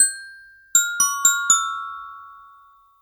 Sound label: Gliding Lark